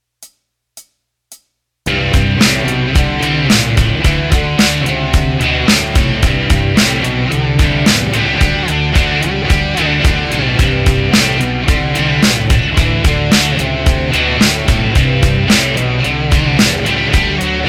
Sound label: Solo